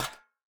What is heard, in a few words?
step, coppergolem; Minecraft